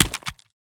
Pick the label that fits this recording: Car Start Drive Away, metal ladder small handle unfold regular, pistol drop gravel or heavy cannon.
pistol drop gravel